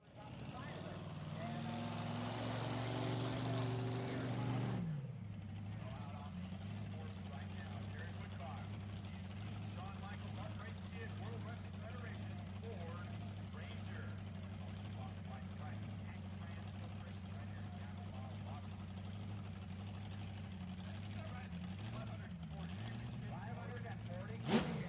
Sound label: Monster Trucks; General Ambience, Pa, Crowds, Monster Truck Idling, Revving, Then Races Thru The Obstacle Course; Shot Cu From The Obstacle Course.